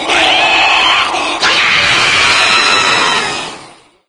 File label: wake solo; doom3